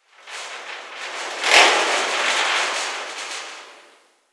Rattle Distant Metal; Warframe; Corpus Gas City; Ambience

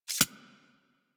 Arachnoid Micro Step; Warframe; Corpus; Steps

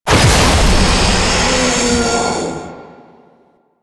Pickup Tear; Warframe; Entrati; Swarm

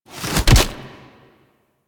Forward Grab; Warframe; Sacrifice; Sacrifice Arsenal

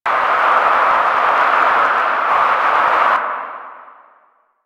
Void Dragon Boost Loop; Enemies; Warframe; Duviri

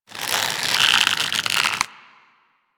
Nunchaku Finisher Tension; Warframe; Tenno

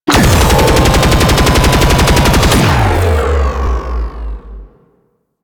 Weapon Fire; Enemies; Abilities; Warframe; Teralyst

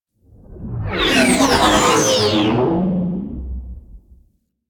Sentient Ship Close Fly By; Warframe; DE; Vehicles; RailJack; RailJackEnemyShips; Space Fighter Snt Coil Agent

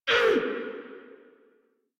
Pain Grineer Female, Barks, Battle; Warframe

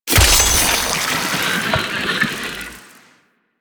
Infested Fishing Spear Fish Caught; Warframe

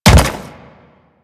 Step Default; Leto

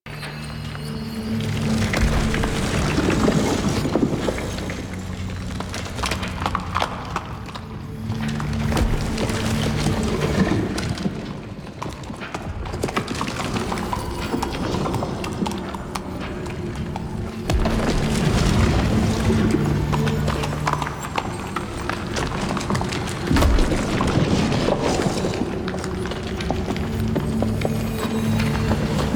Bed Loop, Ambience, Exterior; Warframe; Man In The Wall

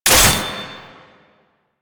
AK47 Last Shot; Warframe; Tenno; Weapons

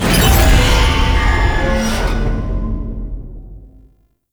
Floor Complete Stinger; Tau; Tau Circles Of Hell

